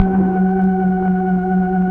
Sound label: keys Optigan; Roland LCDP09 Keys of the 60s and 70s 1